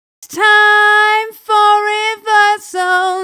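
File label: new vocals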